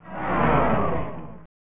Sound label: Crowd; PGA Tour Golf; SNES